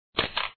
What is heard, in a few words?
tadac; cake love